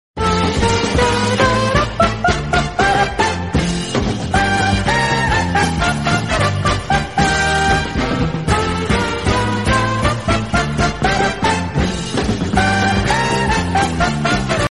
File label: iphone 8 plus french ringtone